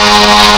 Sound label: twin air horns; gmod; perp2.5